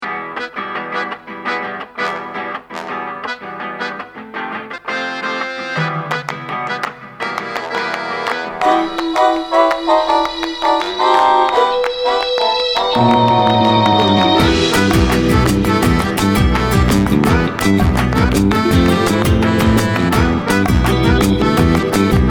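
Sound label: Night Swim, happy, medium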